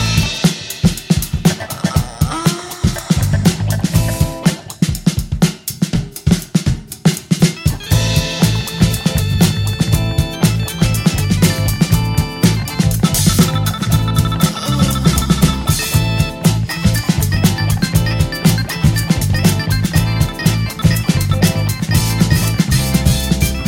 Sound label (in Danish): de gamle 70ere, ringetone